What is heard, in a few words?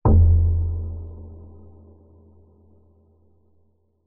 sound design cinematic thud hit lite reverb dark tense